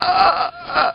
DYING; BattleSNDS

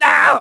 HIT; BattleSNDS

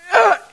hit; BattleSNDS

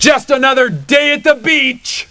LAUGH; BattleSNDS

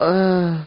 DYING; BattleSNDS

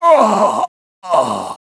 DYING; EN; BattleSNDS

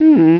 humm; BattleSNDS

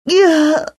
DYING; BattleSNDS

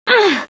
hit; BattleSNDS

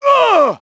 HIT; BattleSNDS

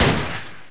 BREAK LOCK

